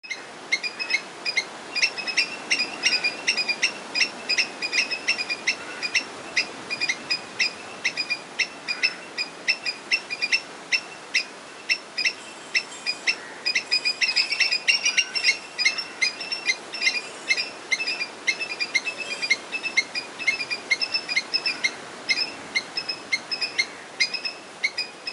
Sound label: funambulus palmarum; srilanka